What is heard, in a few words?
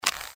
Crossbow Load